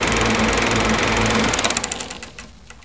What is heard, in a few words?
WHIRRMC